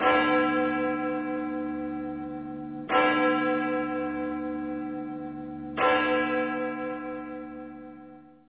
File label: clock